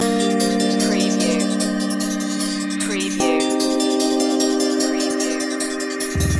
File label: xpress loop